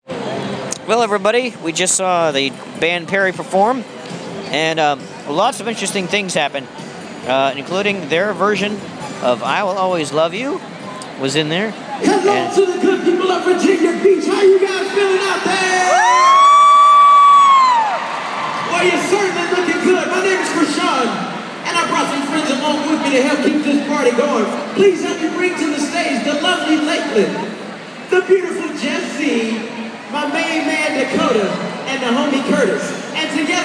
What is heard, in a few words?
country mixed with techno